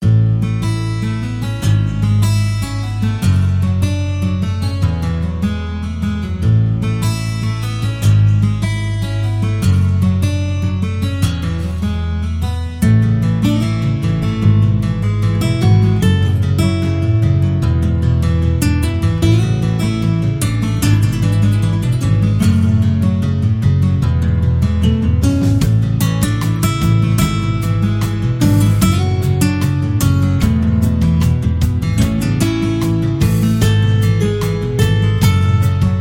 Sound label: cup of tea 150bpm base a sharp; hartwigmedia